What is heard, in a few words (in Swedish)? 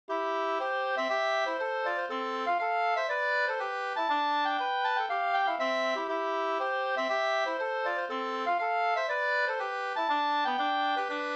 slangpolska